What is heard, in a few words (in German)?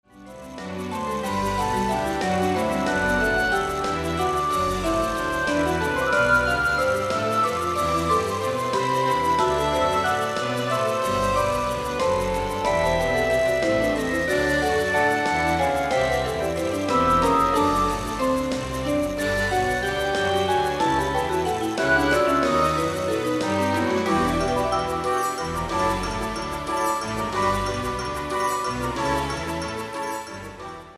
Vibraphon-Konzert